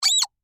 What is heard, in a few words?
Squeaky Toy Excited, Foley, Squeaky, Toy, Excited, Single; Miscellaneous Toy; BlastWave